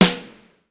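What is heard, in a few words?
batter rm, snare; sr16 sd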